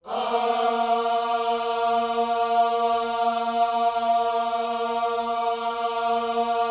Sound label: OHS A#3E, gospel vocal; Spectrasonics; Vocal Planet Vol.1 Gospel; akai